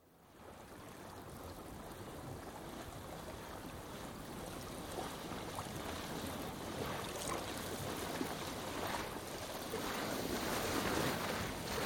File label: Sailing Dinghy, sail past right to left (Recorded on shore); Boats; BBC